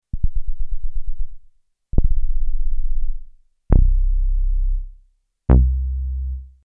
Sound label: closed filter VCO B low; Cybersound T1